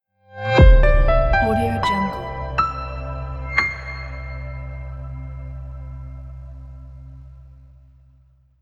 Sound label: classic piano logo